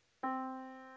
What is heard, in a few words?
yamaha Disk